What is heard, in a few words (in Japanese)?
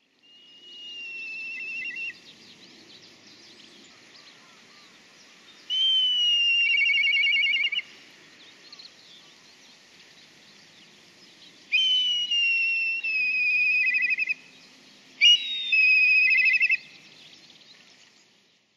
tobi, watarase